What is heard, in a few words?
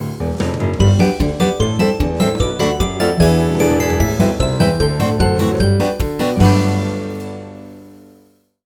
Free Fall Open; netent